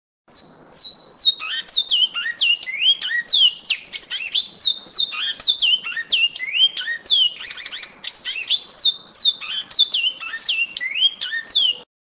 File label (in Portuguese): canto bicudo